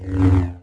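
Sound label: sith lightsaber Swing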